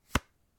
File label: card played